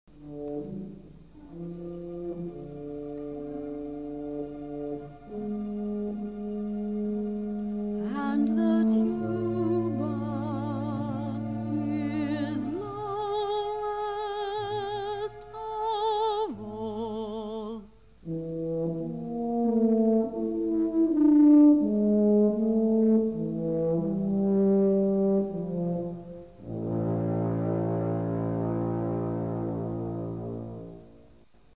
tuba

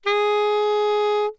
A sharp 4, soprano saxophone

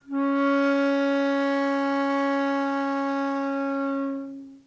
C sharp 4, flute classical

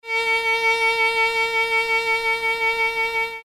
A sharp 4 vib

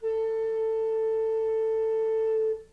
B flat 4 baroque, flute

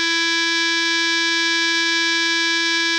G4 normal, clarinet

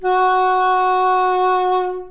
F sharp 4, flute; modern

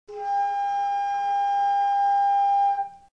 G sharp 5 baroque, flute

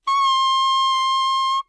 D7 alt 2, tenor saxophone

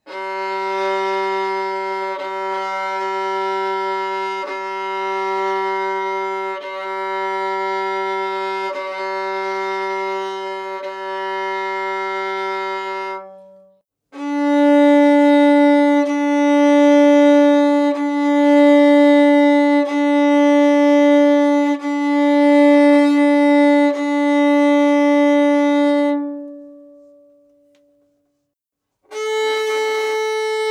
Open Modern Bow Modern

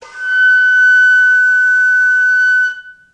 G6, baroque flute

